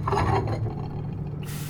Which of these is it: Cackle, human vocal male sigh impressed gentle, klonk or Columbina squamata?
klonk